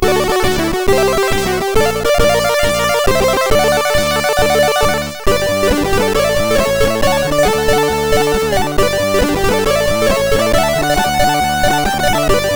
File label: Fanfare, MOS6581R2; Chrono Trigger